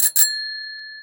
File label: bike bell, street traffic; best service Blue Box